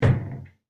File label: hit; breaking falling hit sfx